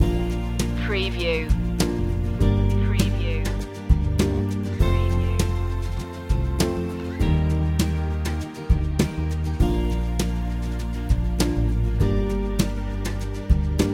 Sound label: inspirational heart main loop